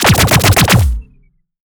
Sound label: future weapons venomspitter shot automatic; future weapons 3; soundmorph